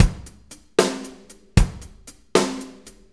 6-8 Loop, Full Kit Loops; Drum Tools

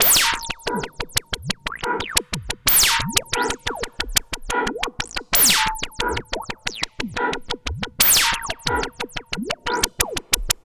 LOOP, Tekno Industrial; East West

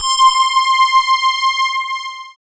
SYN BELL, SYN Analog; Sound & Vision - Gigapack I CD 2 (Roland)

